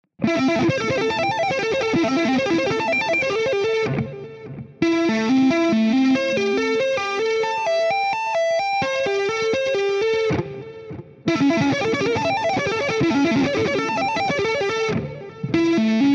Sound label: shred, guitar